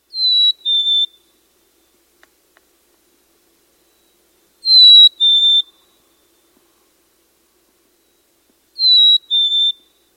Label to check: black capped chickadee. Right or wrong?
Right